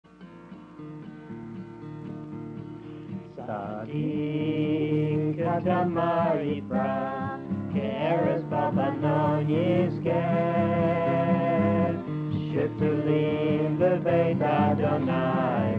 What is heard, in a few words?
Tzadik katamar with guitar; last 4 lines